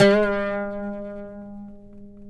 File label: PLK OUD; Roland - LA Composer vol. 3